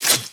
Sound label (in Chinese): 轻攻击命中